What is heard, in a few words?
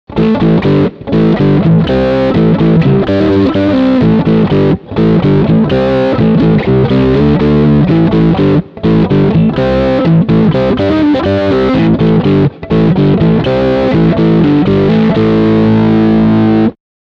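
Crunch Riff Middle Rear Pick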